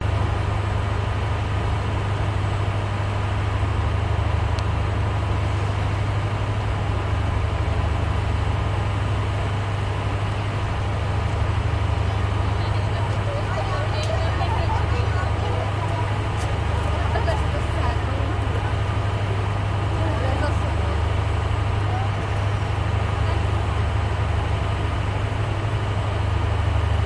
Cruise Liner at the docks with engines running; Budapest Hungary; your free sounds

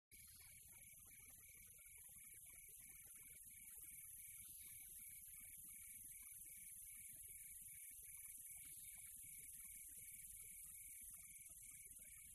NATURE Quiet Tropical Atmo; smartsound

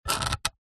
office pen biro draw line on wood

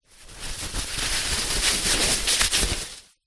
toilet roll piece movement wipe; household; zapsplat

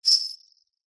musical sleigh bells shake single

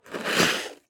ICE Skate scrape two legs peel

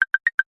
multimedia notification subtle ui clicks pattern

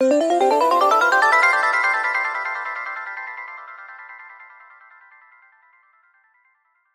magic spell synthesized ascending delay

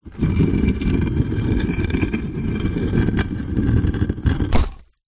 foley rock stone tomb close thud

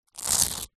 velcro shoes childs pull; foley

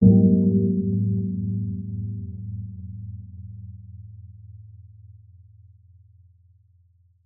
gong sound design muffled low heavy ponderous; Skyclad Sound

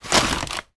household disposable nappy put in to bin

